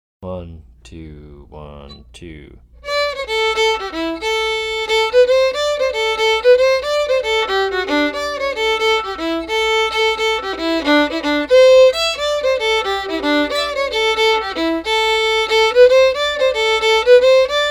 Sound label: yellow wattle jig fast